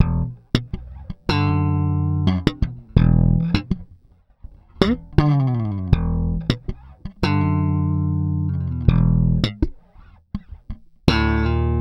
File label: Slap, Bass; Bass X